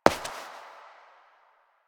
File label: Step; Warframe; Koumei